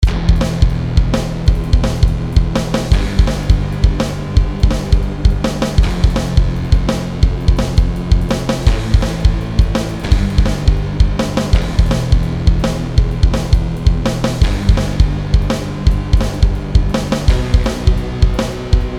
Airplane Fly Loop, rock/metal type music

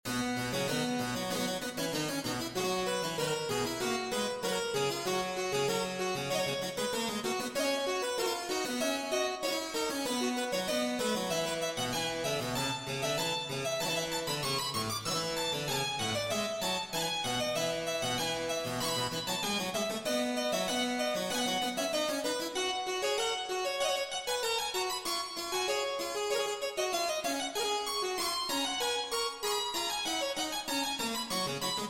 Microtonal Invention in B

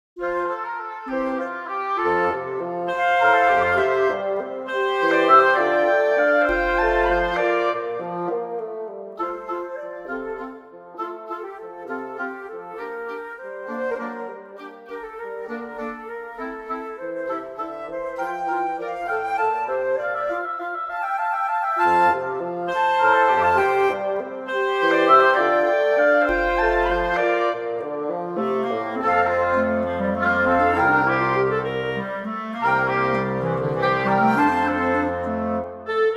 Wind Quartet in F

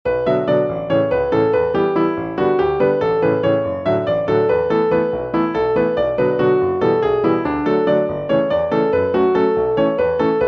polka in seven, duet and drums; suite for duet and drums

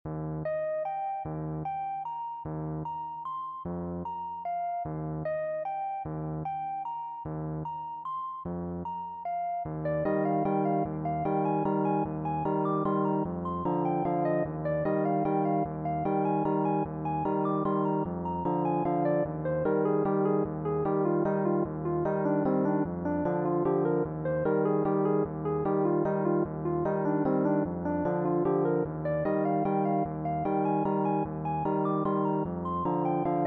Waltz in Eb

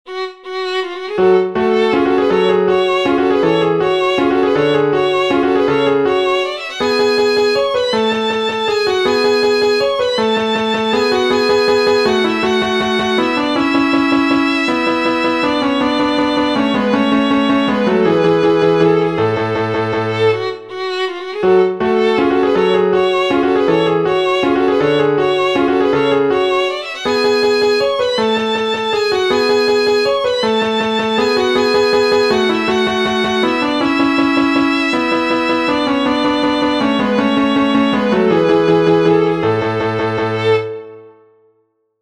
Violin Sonata Movement

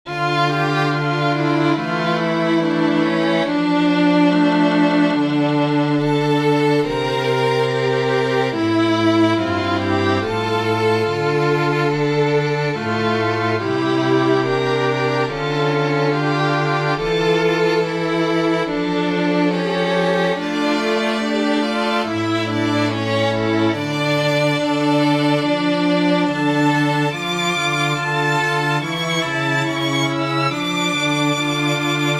string quartet

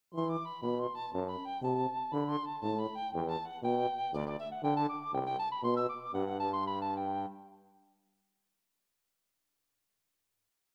Three Sententiae for Piccolo Tuba

